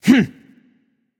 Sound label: gruntjump; smite; voicepack; mercury